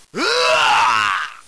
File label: zombie clasico dead; cstrike; zmod